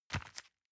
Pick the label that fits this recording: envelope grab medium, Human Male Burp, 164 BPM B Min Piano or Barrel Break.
envelope grab medium